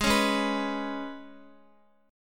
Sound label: Ab Minor Double Flat 5th, Guitar; strummed; guitar chord; x,x,8,6,8,7; Abmbb5; JGuitar